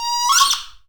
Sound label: HOOTER, Anime Sound FX; COMIC